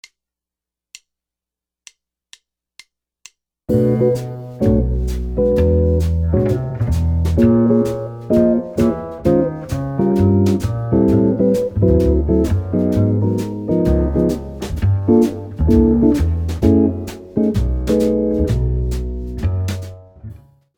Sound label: rhythm changes, jazz guitar